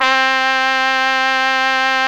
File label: trumpet; Software Explosion 2 - Virtual Computer Pets; yamaha xg; gm tones